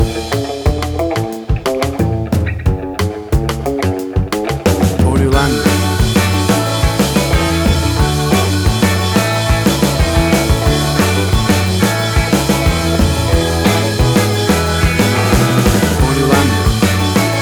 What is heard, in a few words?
Fifties Jukebox Moods